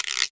Long Guiro, Percussion; Roland BK-5

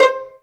pizzicato C4

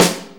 Snare, Snare Drum; Drum Tools

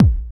DR. LOOP, DRUMS; X-Static Goldmine 3

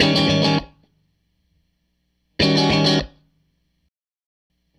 Strat Guitar Loop

